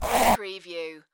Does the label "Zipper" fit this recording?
Yes